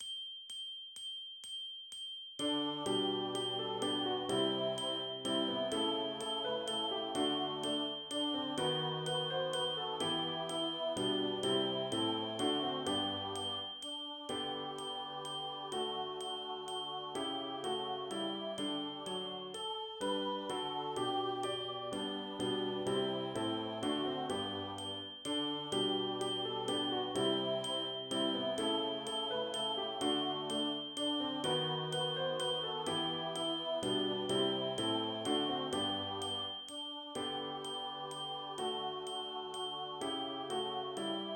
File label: wish you merry christmas avec pulsation audible; traditional